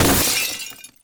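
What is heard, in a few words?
bottle box crash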